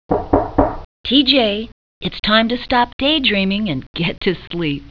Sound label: knock